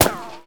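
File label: gun concrete impact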